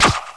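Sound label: boomerang gun; CD Action; soultrap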